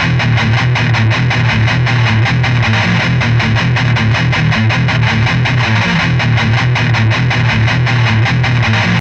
riff; cubase

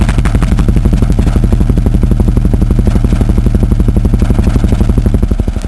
Helicopter; Soundsation; Vehicles

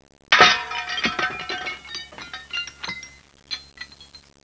GLASS; disaster

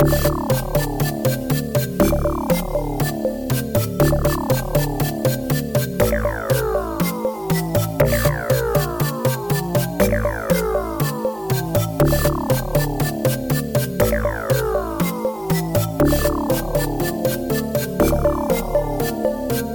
ominous

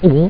GULP

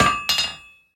hammer; kult

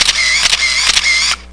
camera

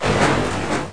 machine; Odyssey; Billsville

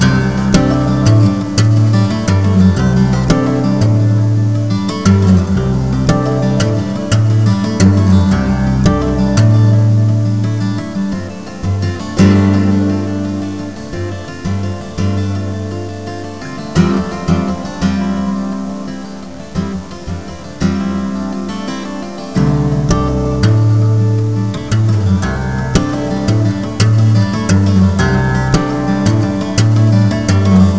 Music; The Ultimate Guide to Advanture Travel

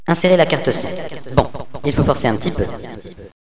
kit; Villagina; Village; ECOLE

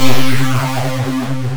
fall, Synth

